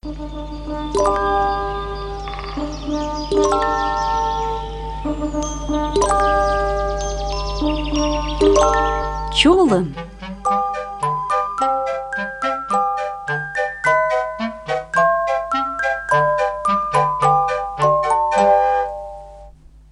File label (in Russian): Джингл, komi language